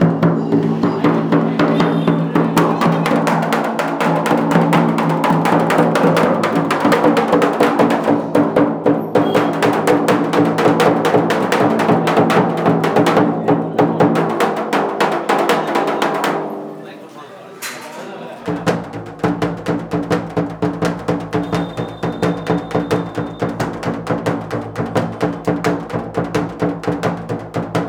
Kids Play Large Kettle Drum for Holi Festival Jodhpur; Environments; Samuel Corwin; Selected Field Recordings from India and Nepal